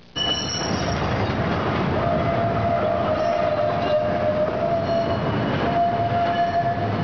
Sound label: squeal